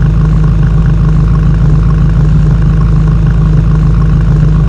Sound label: idle, engines; BMW M5